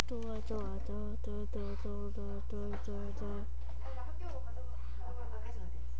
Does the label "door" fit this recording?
Yes